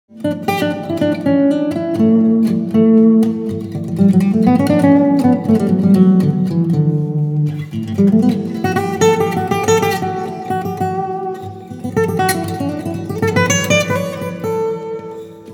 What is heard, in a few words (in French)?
Guitare solo